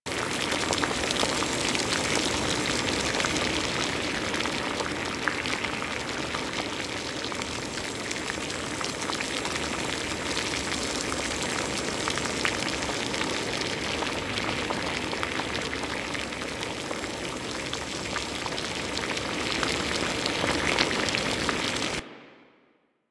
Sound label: Cooking Pot; Ambience; Props; Warframe; The New War; Zariman; Quests